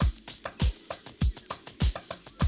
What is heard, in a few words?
drum loop, 100 BPM